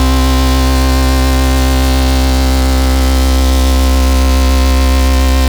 Basses C; Vengeance Samples

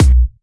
Hard Kick; Vengeance Samples

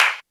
Snares & Claps; Vengeance Samples

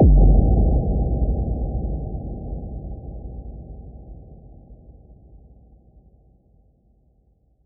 bassdrum reverb FX kick; Vengeance Samples

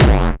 Bassdrums Hard Kicks; Vengeance Samples

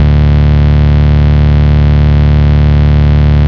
Bass D, Root D

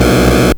Arcade Riffs

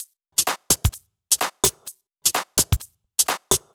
Drumloops 128BPM

